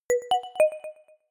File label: notification short bell melody